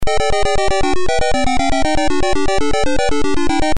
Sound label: power up